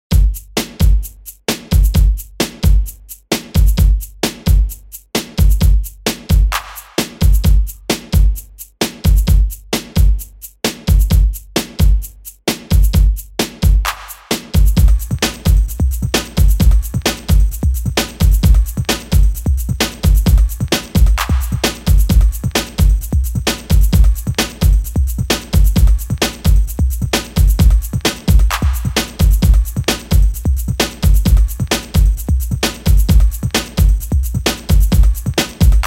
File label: loop track beats bpm 131